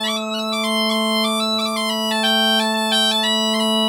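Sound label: bagpipe 90, Celtic Flavours; USB Soundscan vol.42; AKAI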